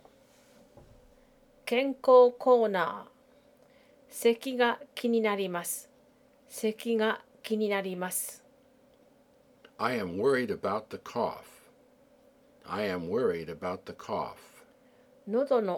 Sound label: Cough